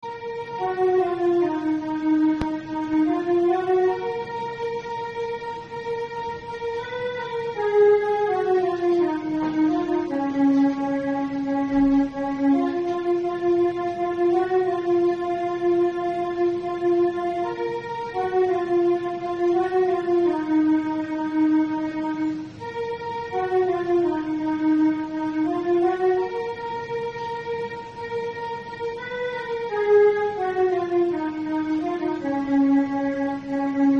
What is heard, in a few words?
piano instrumental bgm